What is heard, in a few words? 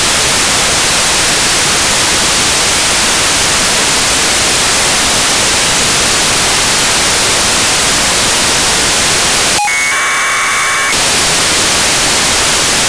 437503kHz AF; KickSat